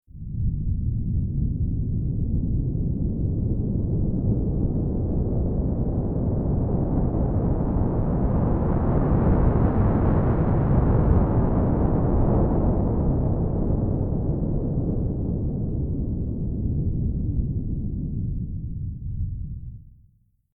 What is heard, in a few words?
Starcruiser SciFi Spaceship Exterior Passing Overhead, Star Cruiser Spaceship Exterior Passing Overhead Hum Of Motors; Spacecrafts